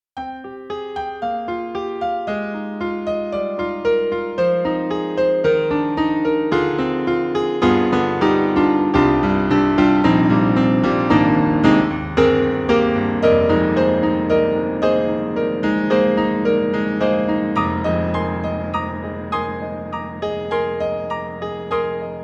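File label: EDIROL SD-20, Acoustic Piano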